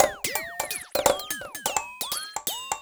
odd shuff 85 fx, drums; ODD SHUFFLE 85 F/X; Loopasonic

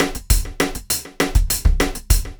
brush muff, drums; Loopasonic

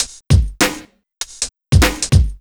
swingy 99, drums; Loopasonic

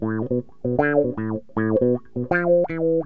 wahhh, bass; Loopasonic

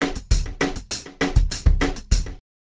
brush muff, drums

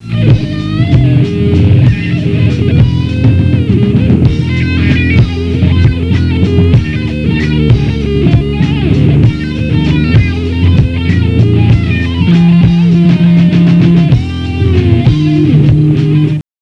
down solo; Stoner anthem; Wrought

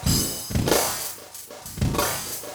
flam, drums; Loopasonic

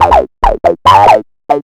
wap wap bass; Loopasonic

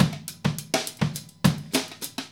live, drums; Loopasonic

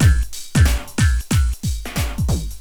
drums; FROOTY SHUFF FX

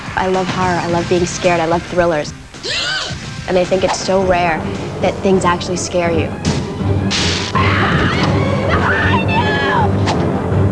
horror; Why she loves horror.

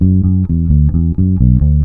bass

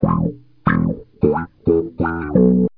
wooaahh, bass

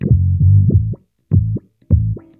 mutron, bass

